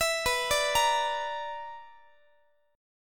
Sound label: B-Minor Major 7th Double Flat 5th, Guitar; BmM7bb5; arpeggiated; x,x,19,21,18,21